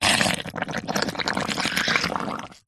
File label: foley liquid wet squelch bubble gungy slimy